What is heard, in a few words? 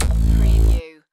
Hi-tech Panel Servo Button